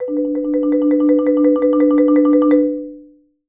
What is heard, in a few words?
Marimba G3, MRMBAROLL; Sampleheads - Dave Samuels Marimba & Vibes